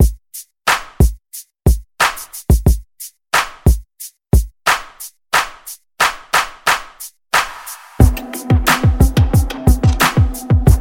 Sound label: Pop Mixshow Re Drum 90 Bpm; Top40; Vegas Edit